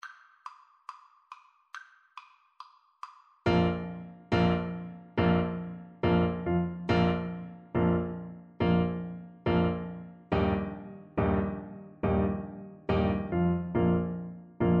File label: EUPH, Baritone Horn